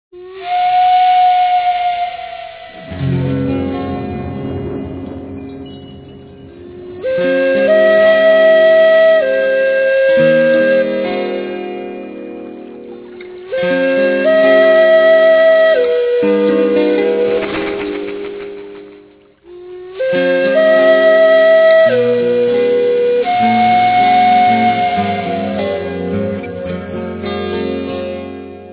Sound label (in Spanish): flauta